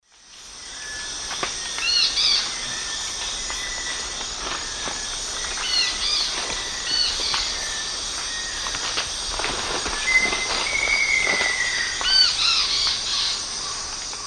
Myrmotherula longipennis zimmeri, scold, S. America Eastern Lowlands